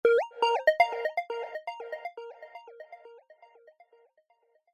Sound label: multimedia musical logo minimal tech science beeps arpeggio; zapsplat